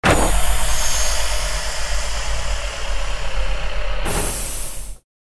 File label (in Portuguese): porta pressurizada lab